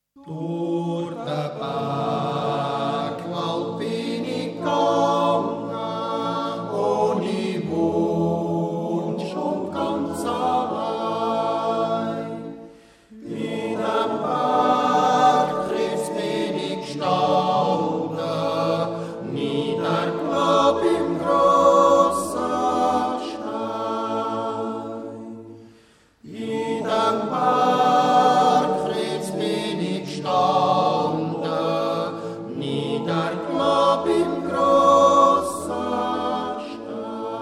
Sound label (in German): jodlergruppe, musik; Schlieraetal; fuers mueti